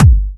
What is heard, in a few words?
Bassdrums UK Tran, UK Trance Kicks; Vengeance - Essential Clubsounds Vol.2